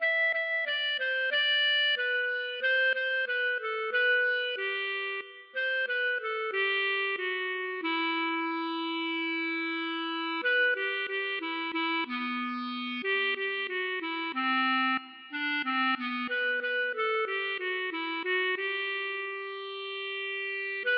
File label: klezmer